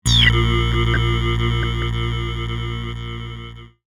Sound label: Power Ray, Scifi; Power, Off, Synth, Descend, Square, Wave, Science, Fiction; Spacecraft Sound Effects; BlastWave